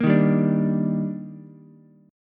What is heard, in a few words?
Eb Major 7th, Ukulele; EbM7; strummed; JGuitar